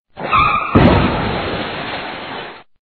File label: Crash